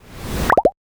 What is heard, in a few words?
pops